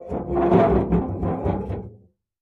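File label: door creak large, squeaks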